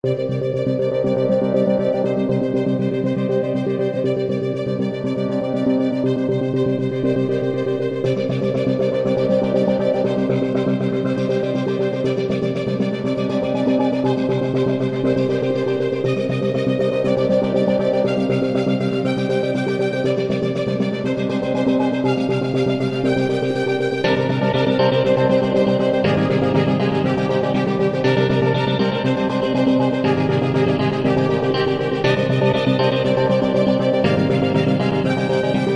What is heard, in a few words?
Rhythm and Melody Combo 120 bpm